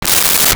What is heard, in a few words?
crank, Clock